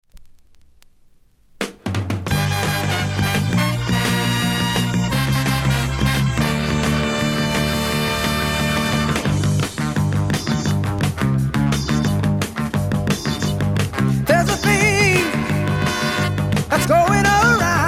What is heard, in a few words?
Funky fever